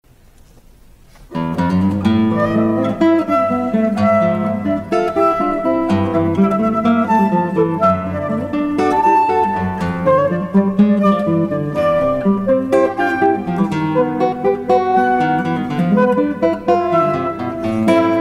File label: guitarra y flute